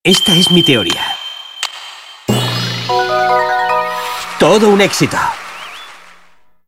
win puzzle; clive

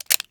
reload

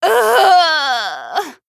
uuugh; toko

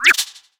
cancel

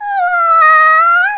uwaaaah

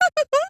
giggle; tsumugi

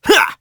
grunt; sonic; vc roger

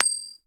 coin toss

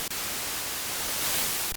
static

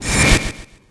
flashback

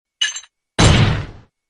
desk slam; blackquill

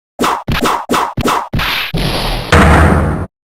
rampage; lonekat takumi

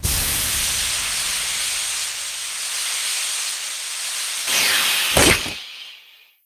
landing; sorin

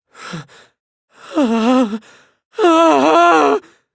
scared

